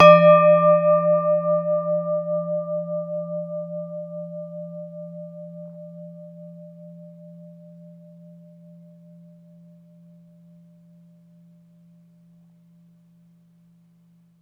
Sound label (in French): couvercle; In the kitchen; 96khz 24b mono